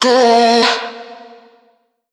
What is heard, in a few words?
Vocal one shot Bb; Slate Digital Omnivox Vocal Sample Pack